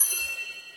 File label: bell plate